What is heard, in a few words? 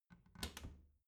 bathroom cabinet door creak close soft